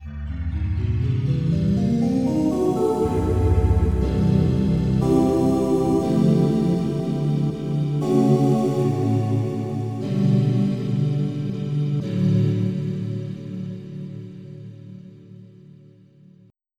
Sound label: Space Voice; EverQuest